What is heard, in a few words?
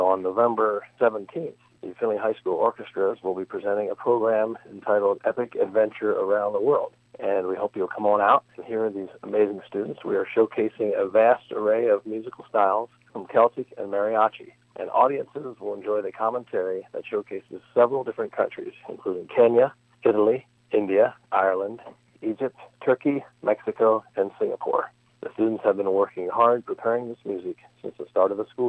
orchestra pops concert